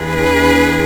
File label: String; Mo' Phatt; Digital Sound Factory